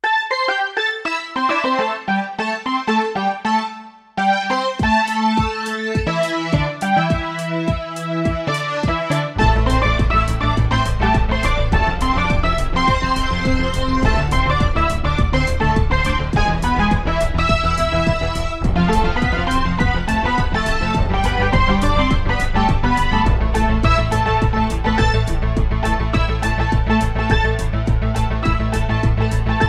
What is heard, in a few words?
Ensemble Set